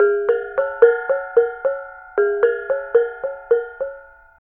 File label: BONANG; Spectrasonics; Heart of Asia; BONANG PH